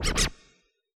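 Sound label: scratch low; AnlgKit1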